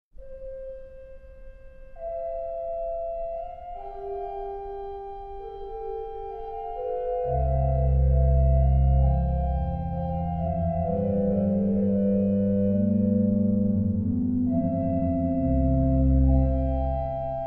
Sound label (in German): Concerto C-Dur Largo